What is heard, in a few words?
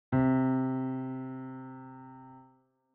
tenor note